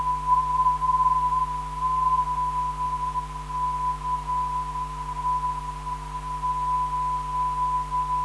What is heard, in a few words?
LCT 373kHz